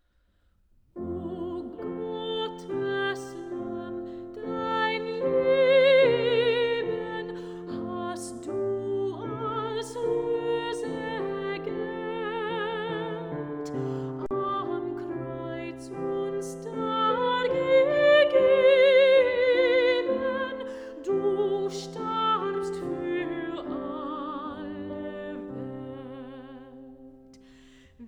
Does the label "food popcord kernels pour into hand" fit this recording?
No